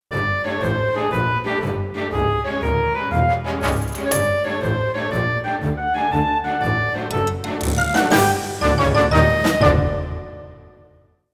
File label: Lose; Buzz Lightyear of Star Command; Dreamcast